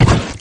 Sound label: limbs; halo; flood